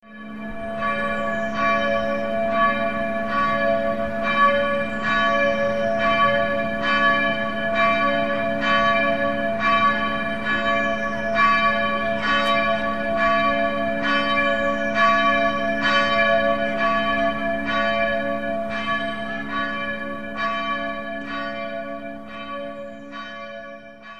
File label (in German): glocke, kirche